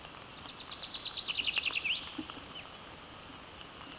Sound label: Fringilla coelebs